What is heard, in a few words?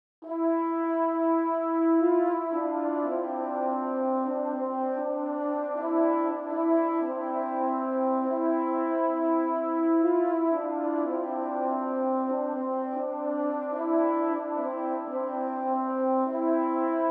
Trombone Ensemble